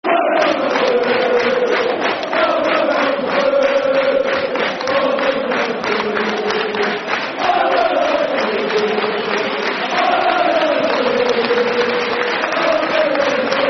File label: All The Bluebells Are Blue, Football Chants (Soccer Songs); Rangers GRFC